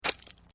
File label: Metal Light Movement Minor